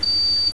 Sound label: Buzzer, Train; APT; OpenBVE